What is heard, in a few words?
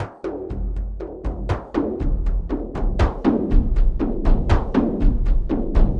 Big Drum, Frame Kendang; Sonic Foundry (Sony Creative Software) - Friendly Fire; Drums; Long